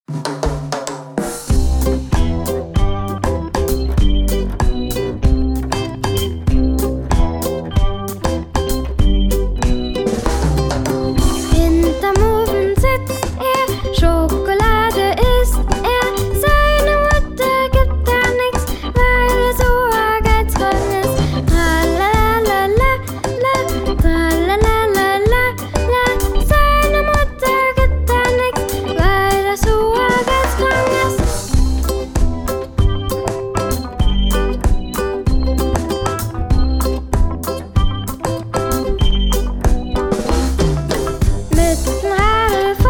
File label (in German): Kreuzpolka modern